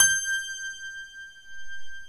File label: HRP RCDR&FLT